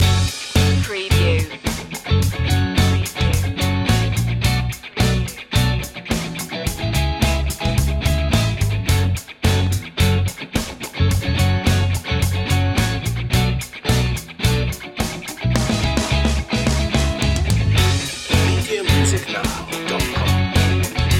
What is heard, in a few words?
disco funk